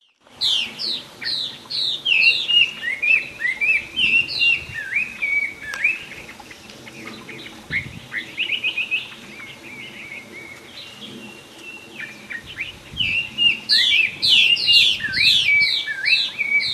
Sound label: Taiwan Hwamei calls, Dongshi Taiwan